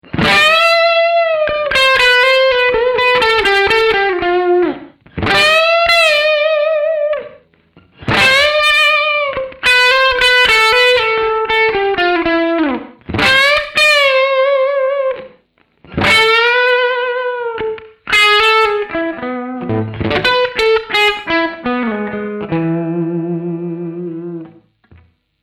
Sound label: 800 high and low cut